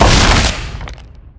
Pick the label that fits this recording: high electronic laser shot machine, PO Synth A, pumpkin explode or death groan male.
pumpkin explode